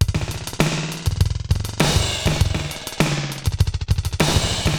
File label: Digital Delay RX17 (100BPM), cyborg beats